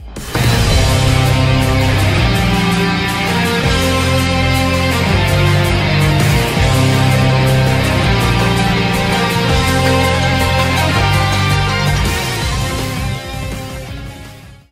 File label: intro music; azur lane; sakura empire